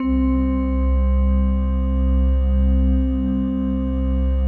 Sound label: PAD, ATMO PADS; Best Service; XX Large